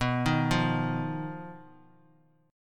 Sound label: B-Major Flat 5th B down, Bass Standard; arpeggiated; JGuitar